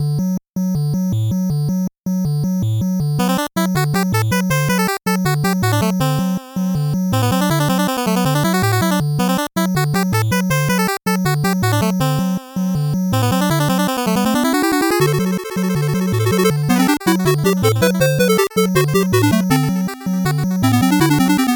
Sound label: 8bit bgm fast